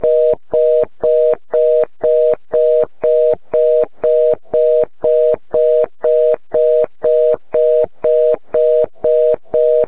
Overflow

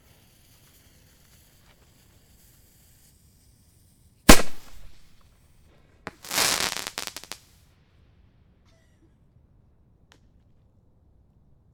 Firecracker Small Fire Shoot And Explode Crackle

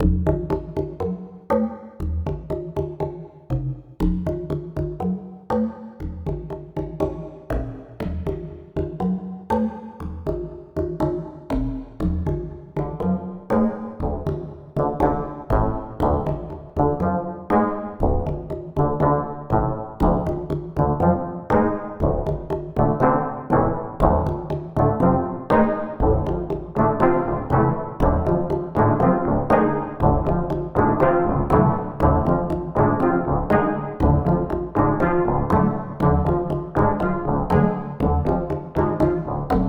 Dramatic

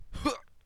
Hurt Male

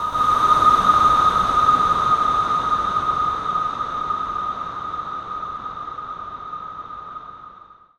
Steampipe Ghostblow G 2

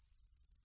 Buzz

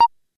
Perc Beep

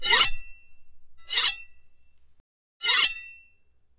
Knife Blade Sharpen Zing

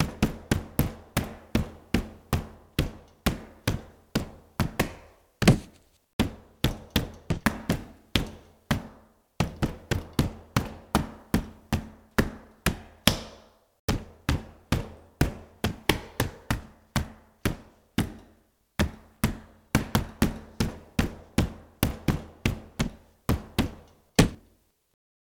Door Pounding

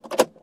Keys Inserted Into Ignition

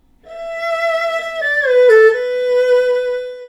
Erhu frag

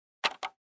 Button

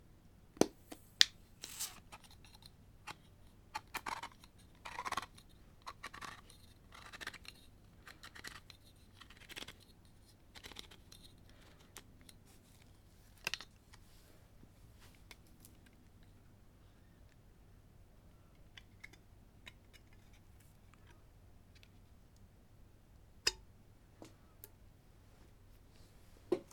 Food Can Opening

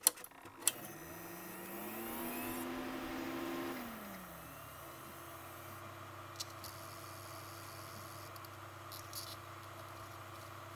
Computer Power Up

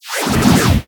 Rotating Swooshy Combat Hit

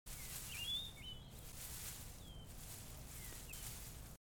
Leaves Rustling

